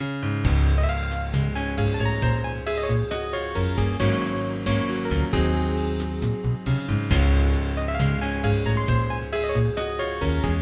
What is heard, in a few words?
jingle